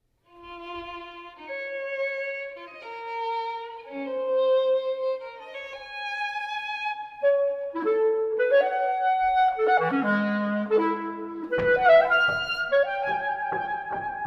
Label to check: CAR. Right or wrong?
Wrong